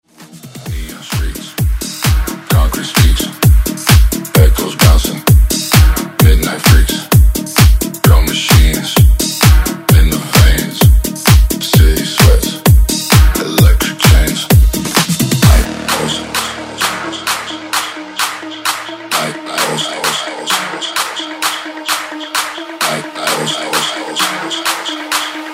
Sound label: House Redrum; remixplanet